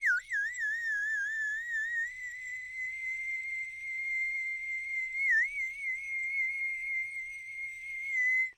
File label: Bird whistle, clay, painted, water, airy, wispy, light, irregular, lingering, long breath; Eiravaein Works; Vaeyan IV